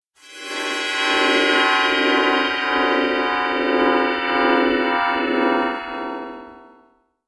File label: chord